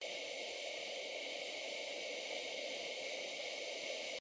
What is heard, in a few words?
Vapor